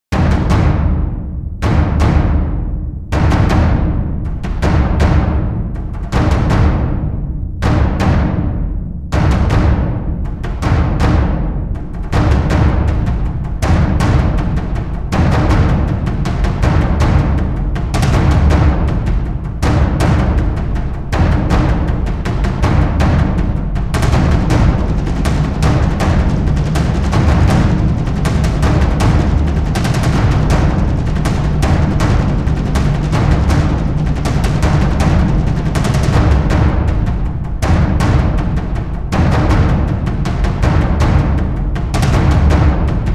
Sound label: Epic Drums; Air Guardians; Windows